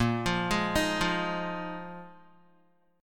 A sharp 7th Suspended 4th down Guitar 4F 4A 5C 5G 5C 6E; A#7sus4; arpeggiated